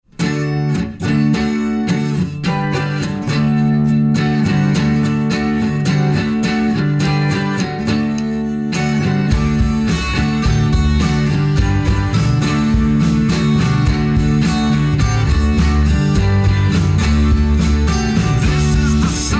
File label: 12 String Guitar